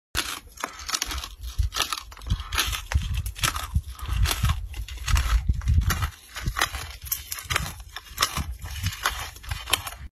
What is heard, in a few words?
Eating dry ice; چالش خوردن یخ خشک